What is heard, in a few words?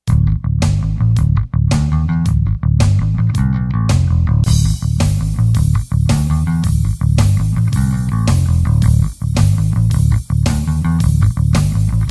Bass Drums